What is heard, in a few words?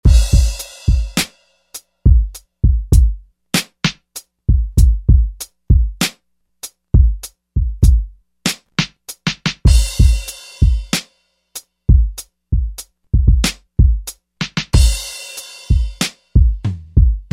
Tight Kit; Roland SRX-05 Supreme Dance